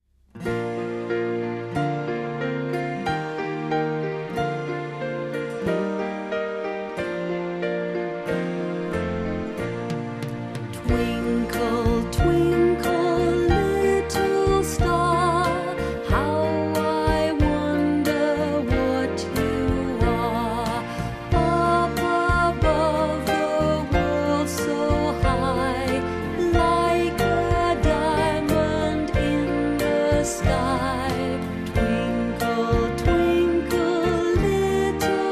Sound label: Twinkle twinkle little star